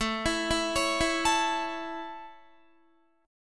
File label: A Major, Guitar; A arpeggiated; 16,19,16,18,16,17